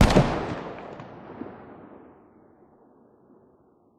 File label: scar20 distant, weapons; Counter Strike Model Pack; CS2